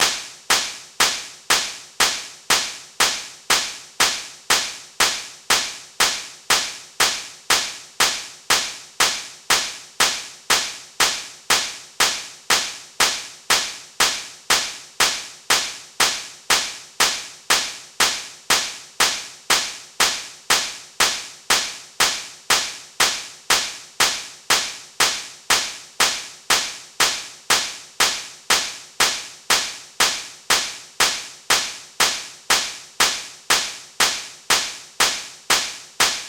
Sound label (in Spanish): Hand Claps a Tiempo